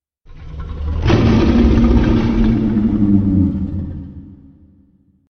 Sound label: Snarl; Godzilla